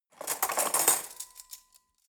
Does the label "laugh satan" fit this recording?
No